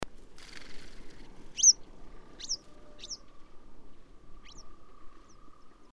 Yellow Wagtail Motacilla flava beema, flight call, Ulytau, Kazakhstan; migrating